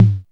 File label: BIT TOM H; Back In Time Records; Big Bang